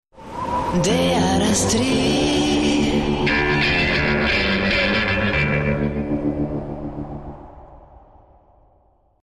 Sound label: image western